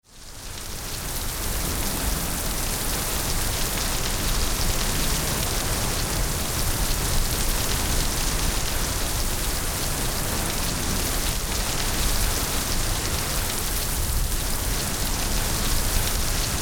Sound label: Heavy Rain